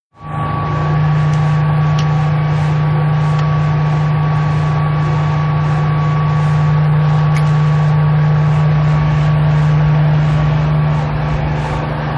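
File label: Heli Interior Idle Seat Click, helicopter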